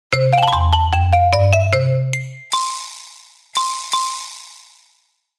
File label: cat success